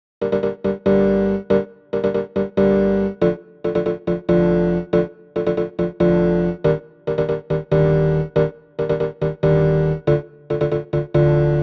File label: G Major 70 BPM